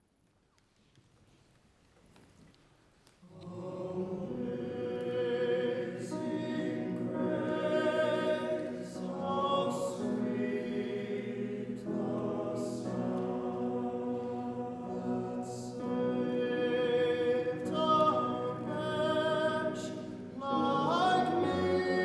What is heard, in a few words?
Amazing Grace, tenor; choir